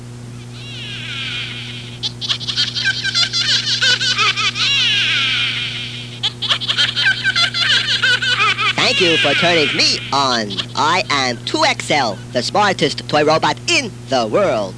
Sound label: startup